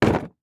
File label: Industry Tool Box Put Down